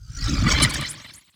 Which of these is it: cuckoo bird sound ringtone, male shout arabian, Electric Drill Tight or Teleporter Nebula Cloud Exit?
Teleporter Nebula Cloud Exit